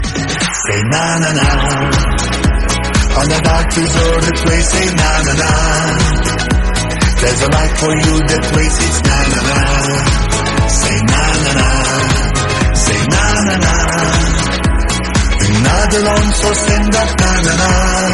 na na na best call notification tone ringtone; iphone ringtones

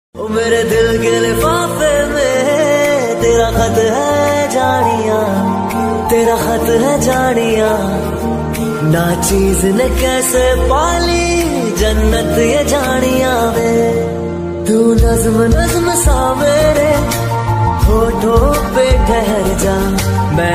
top bollywood ringtone

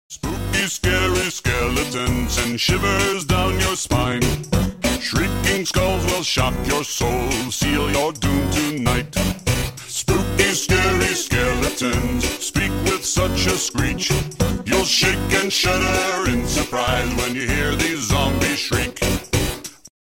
english song ringtone

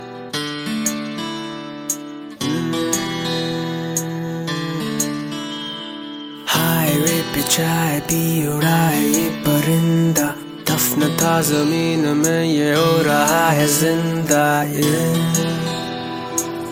bollywood theme song ringtone

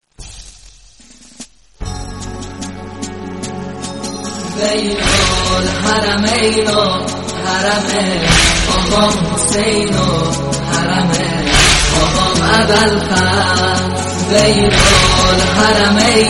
persian noha tune

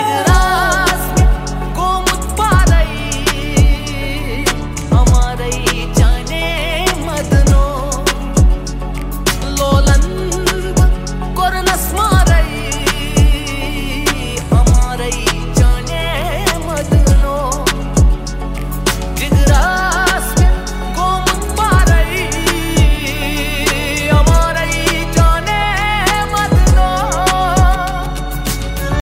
hindi ringtone, bollywood

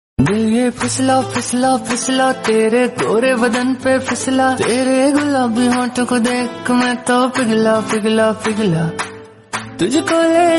top love song tone ringtone, bollywood ringtones

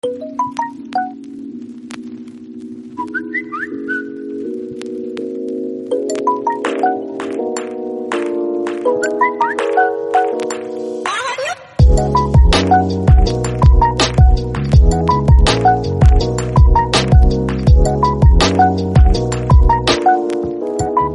mix mobile phone tone; nokia ringtones

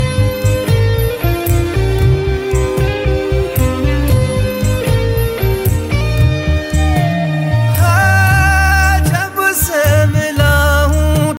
hindi ringtone, bollywood

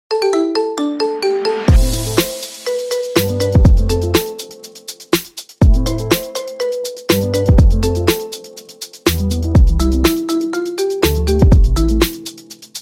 marimba remix ringtone

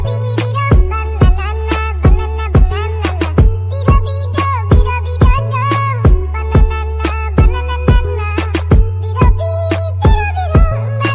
cartoon ringtone

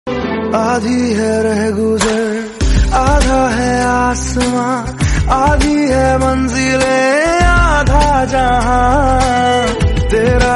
cool bollywood song tone ringtone

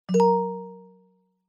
sms phone ringtone

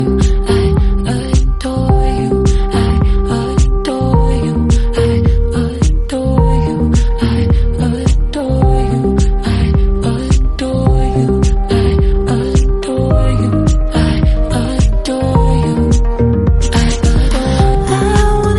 english song tone ringtone

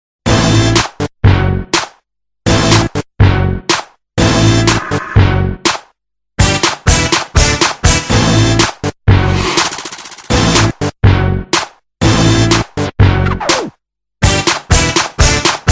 moto boom boom ringtone

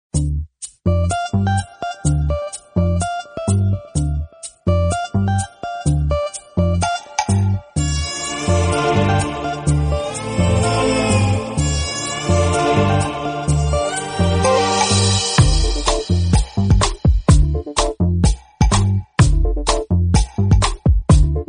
entertaining melodious musical tone ringtone

